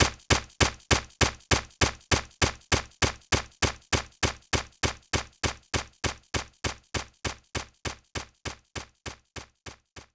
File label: swords